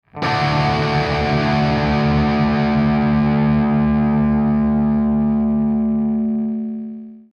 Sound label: power chord